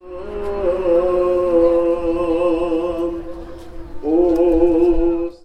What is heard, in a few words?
orthodox priest singing